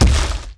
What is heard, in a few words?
golem footstep; Warlock's Golem; Dota 2